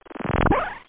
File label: BLIP; G-Netix; ISM